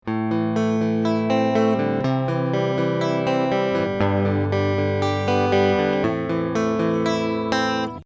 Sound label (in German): gtr chorus unbearbeitet